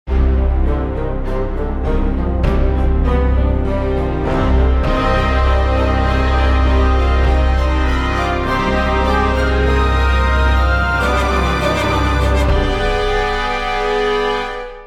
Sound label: Miniature for Orchestra